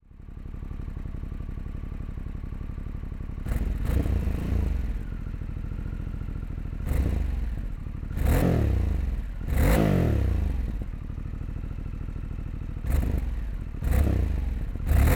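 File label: APEX Mode with ride by, exhaust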